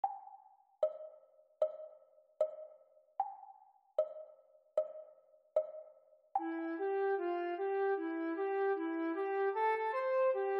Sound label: PLAYALONG Flute Duet; Grade 2; Exam Speed